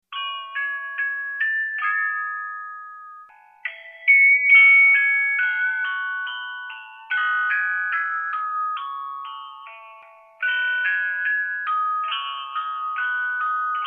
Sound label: Music Box